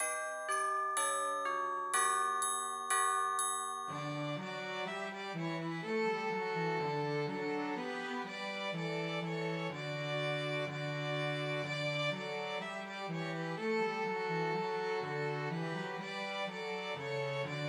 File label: Christmas Trios for Two Violins and Cello; Ding Dong Merrily on High